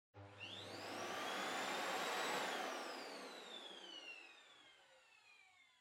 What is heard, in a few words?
Vacuum Cleaner Far Vacuum Mid Short